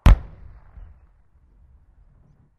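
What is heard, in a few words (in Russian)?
взрыв ракетного снаряда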